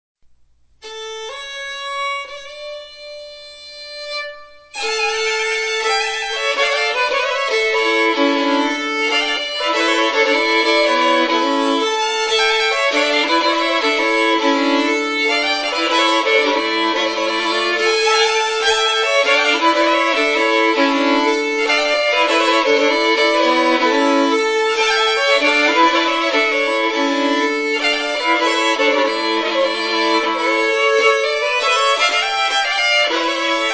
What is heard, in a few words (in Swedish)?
Polska